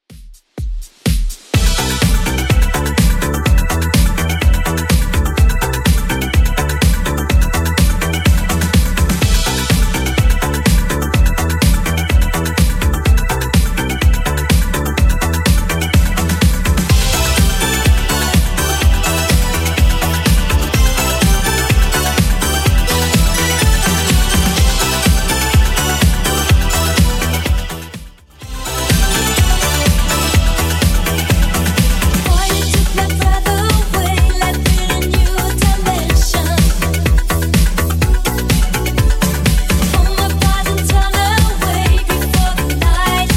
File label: 80s Redrum 125bpm